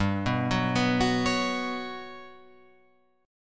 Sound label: G Major Sharp 11th G down Guitar Open F; arpeggiated; JGuitar